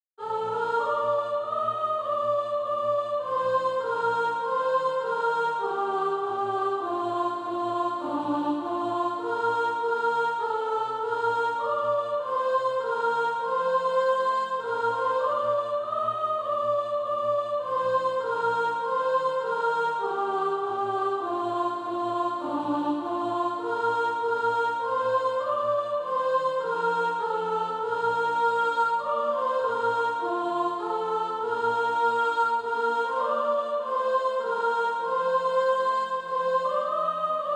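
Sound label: soprano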